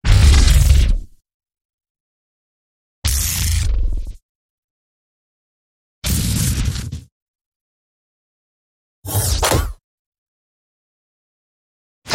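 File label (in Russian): сборник аудио переходов transitions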